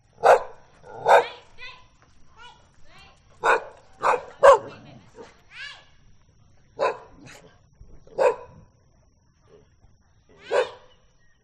Single Sound Vietnam Hoi An Angry Dogs Neighborhood